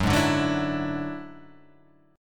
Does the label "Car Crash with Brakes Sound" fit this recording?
No